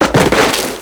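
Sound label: body fall; WhiteOut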